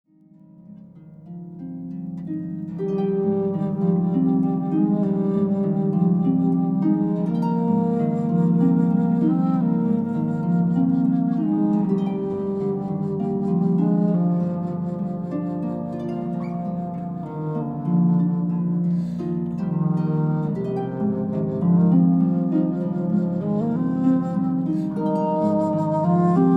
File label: Intermezzo bassflute harp excerpt